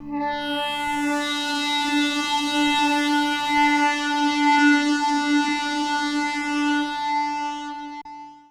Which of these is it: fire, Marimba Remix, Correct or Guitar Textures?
Guitar Textures